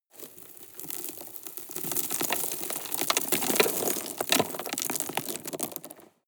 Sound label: drop rock debris soft